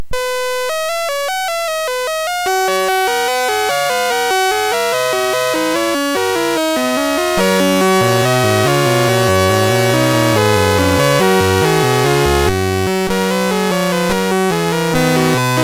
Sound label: sid MOS6581R3; Videobreak 17 BASIC